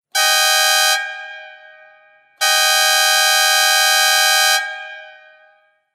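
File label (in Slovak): fanfára 2 tónová 12V trúbka chróm; fanfara 3 tonova 12v; stebel gpa3 trumpeta chrom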